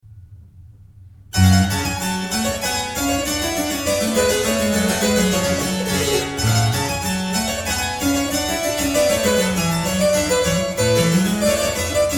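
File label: Ruckers harpsichord Gavotta G minor; Bach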